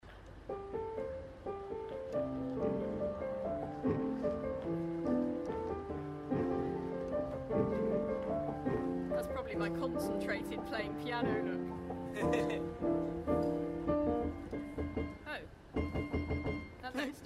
a really bum note while playing a jazz waltz on a piano at st pancras station